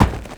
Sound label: Crewman Footstep Walk; Characters; Corpus; Crewmen; Footsteps